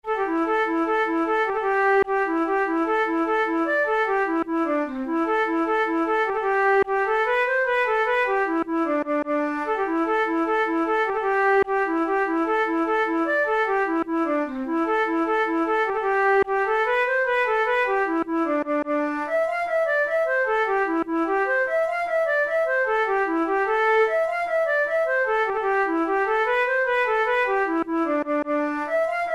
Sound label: jig, flute tunes